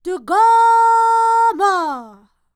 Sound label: Vocal Planet World Voices, NOMADIC